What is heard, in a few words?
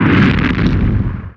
Impact; Falcon 40